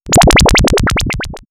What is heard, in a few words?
Sci Fi Cosmic Goo, synth fx; X-Static Goldmine